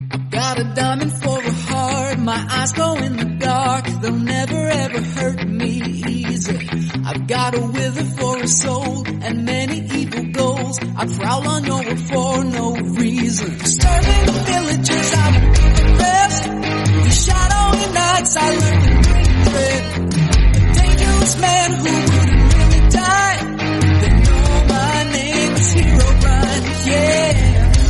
song english

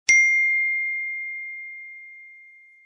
iphone ting msg tone

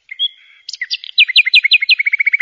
love birds sound sms message tone notification

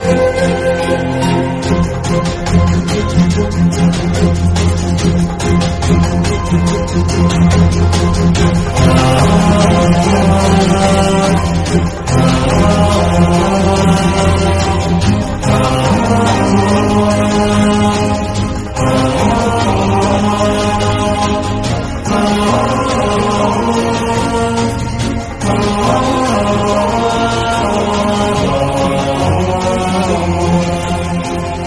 background music; Rashi

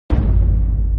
boom sound effect

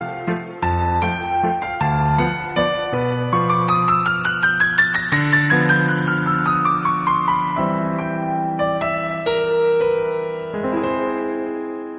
piano cover instrumental; up

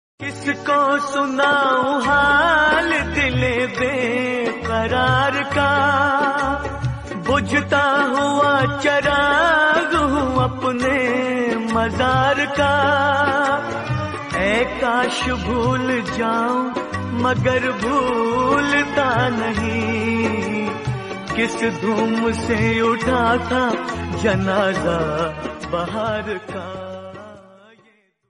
sad hindi song

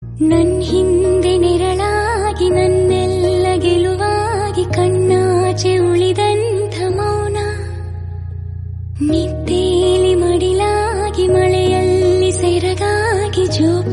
mother song

